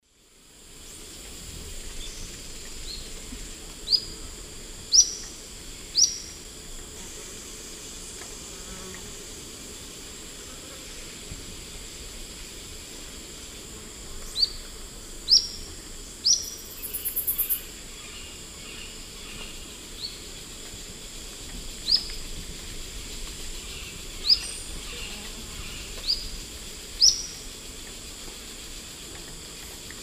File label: Tolmomyias viridiceps, song; S. America; Eastern Lowlands